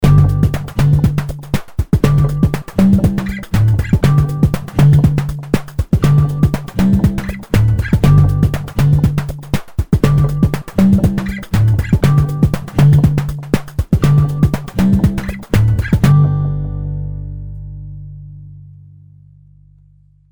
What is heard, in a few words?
Soft Guitar; Logorhythm; SHORT BUS